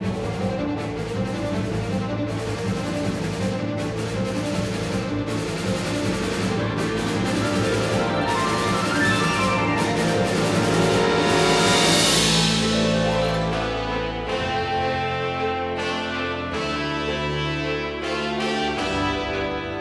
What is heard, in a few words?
Battle